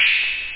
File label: drums; OctaMED SoundStudio V1; drums misc